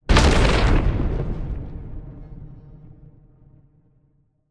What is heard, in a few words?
Large Sci-Fi Explosion